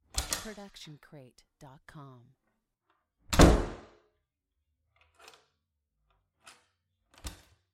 house doors

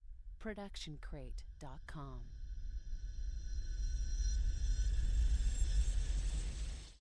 Reverse Magic Shimmer; SoundsCrate

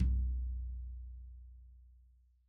tom floor; soundscrate

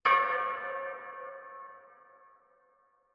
Eerie Fast Metal Hit; SoundsCrate

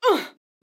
woman injured grunt; soundscrate

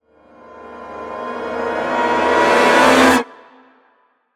brass high swell

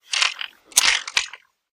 gun cocking

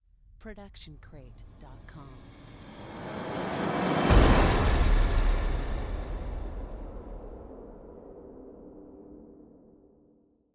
horror hit

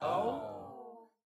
audience oh

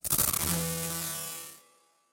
scifi weapon laser; soundscrate

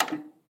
VHS buttons; SoundsCrate

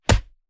Fight Impact; SoundsCrate

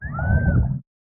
underwater scream female; soundscrate

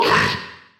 big dino grunt

